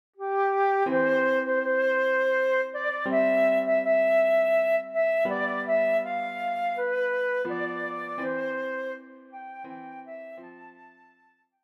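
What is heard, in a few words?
flute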